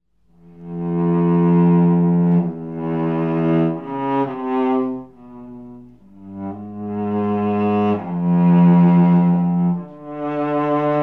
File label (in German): Streichquartett Nr 1 e-moll Andante